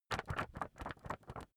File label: magazine wobble soft